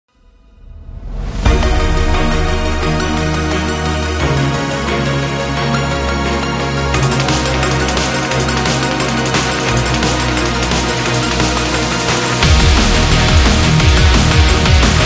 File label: Epic Sport Rock; gym rock